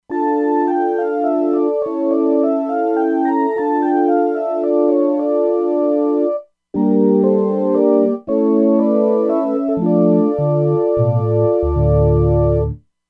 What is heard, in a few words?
Chapel Organ, E-mu Proteus-2; Factory Presets